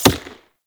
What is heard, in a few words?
Throwing Knives Impact Wood; Weapon Impacts; Player; Environment